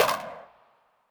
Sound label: Clap Trap Verb